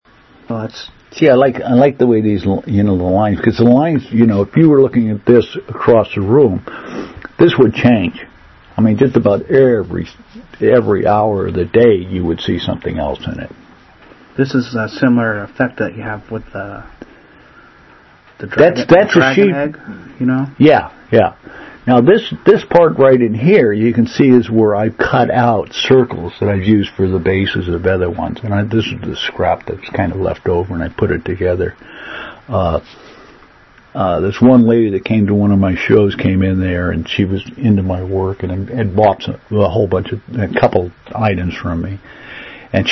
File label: oriental landscape